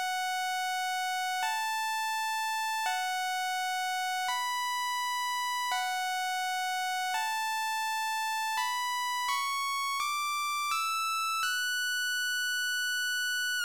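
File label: synth, B minor, Hip Hop & RnB; West Coast Rap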